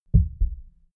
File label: heart beat double quiet; comedy-cartoon; koerpergeraeusche